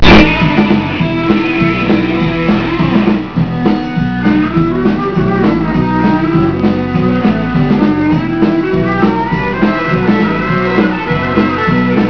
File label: solo